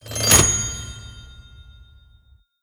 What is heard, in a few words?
pull slot; greed; slot eyes